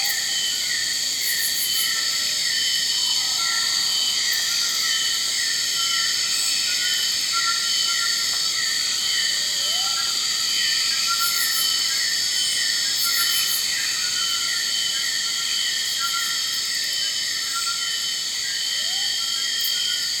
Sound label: jungle exterior; Counter Strike Model Pack; CS:GO; aztec2